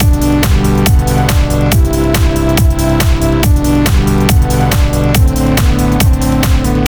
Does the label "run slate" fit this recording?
No